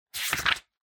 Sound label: Book Flip